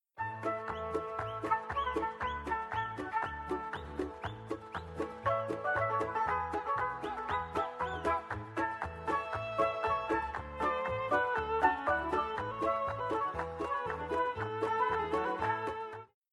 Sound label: BGM Paytable; Thanksgiving Blast